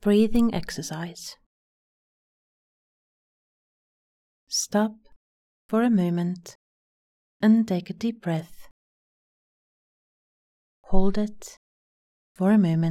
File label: breathing exercise